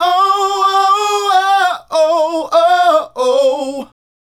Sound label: Male Group, Vocal; Sonic Foundry (Sony Creative Software); Vocal Toolbox